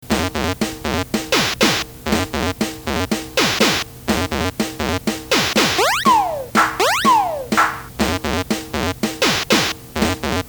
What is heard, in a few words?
end whistle